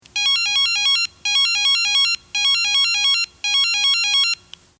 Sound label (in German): Pieper